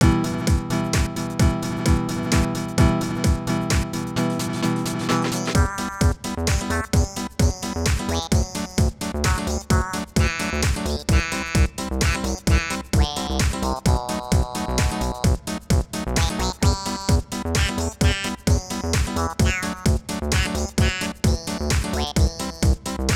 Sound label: Flamenco House Remix